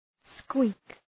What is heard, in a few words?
squeak